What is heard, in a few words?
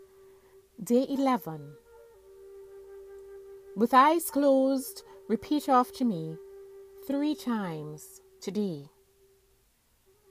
healing mantra